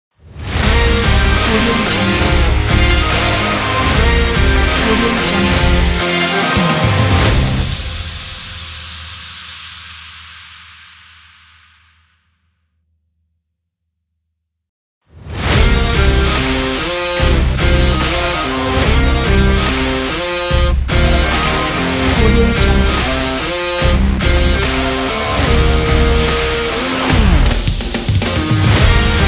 powerful epic success logo; audiowave music

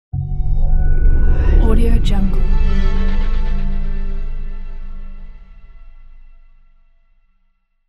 discovery sound

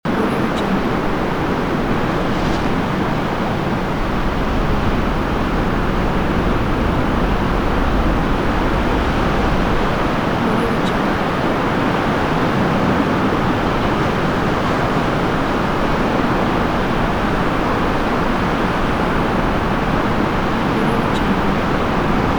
squall storm at seaside loop

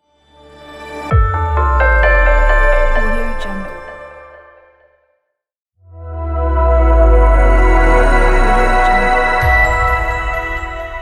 short ambient logo reveal pack

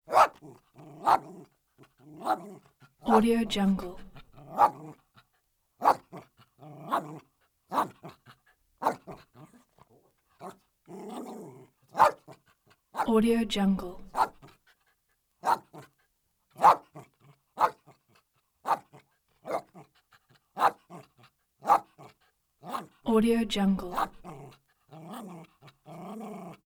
terrier small dog growls snarly barks panting

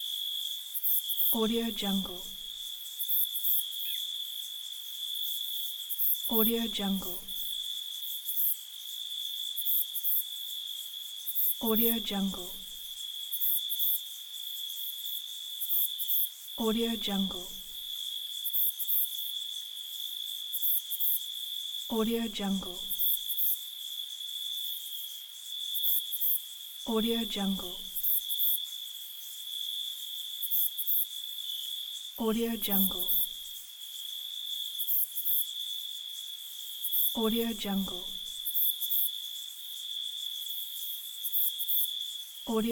night crickets and insects; kuksaudio

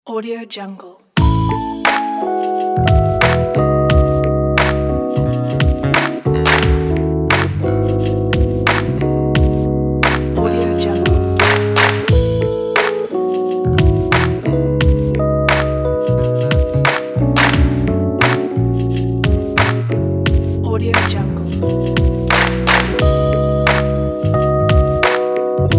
feel good neo soul

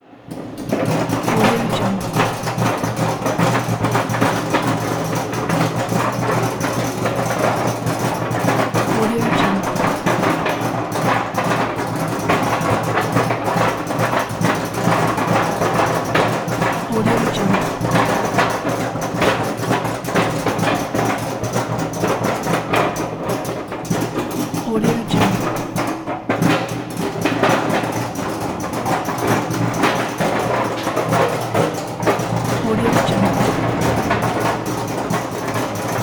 rolling push cart

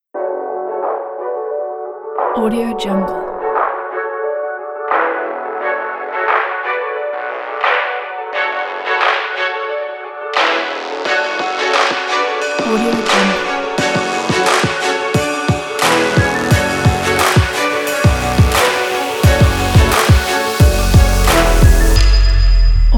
epic sport trap dramatic workout

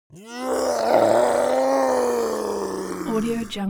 creature zombie human change; smartsoundfx

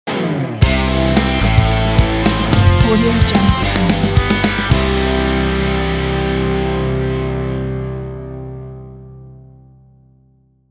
powerful fresh rock logo

